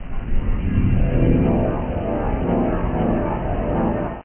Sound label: ORGAN